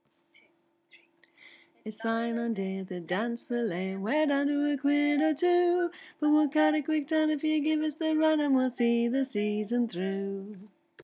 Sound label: Sign On Day harmony, Folk Choir music